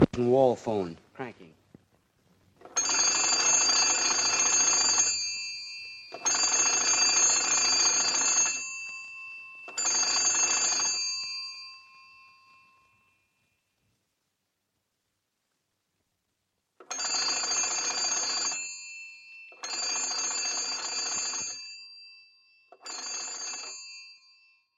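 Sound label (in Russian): старомодная настенная телефонная рукоятка и звонок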